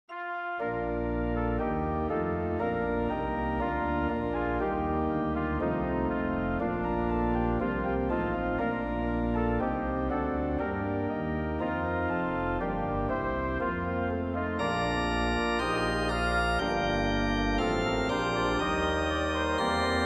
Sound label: Kreuzberlied prelude, organ; Hallerndorfer; Prelude in B flat composed for the church of Hallerndorf.